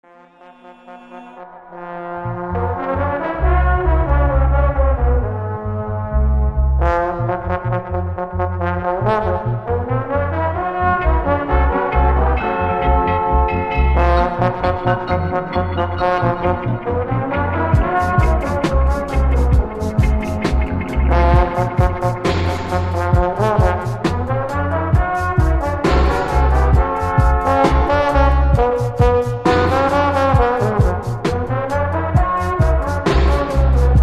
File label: African Horns